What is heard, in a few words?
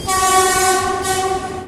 horn